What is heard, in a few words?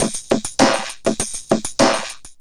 Vocobeat; Sonic Foundry (Sony Creative Software)